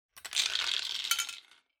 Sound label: Drawing Machine Garapon, Few Spin Drop